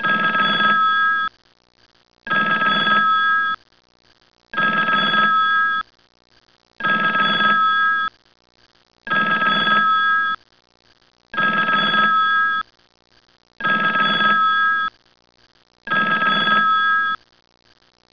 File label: Phone